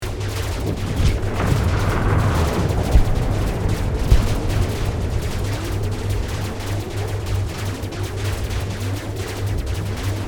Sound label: Alien space battle ambience; Sci-Fi Ambience; futuristic world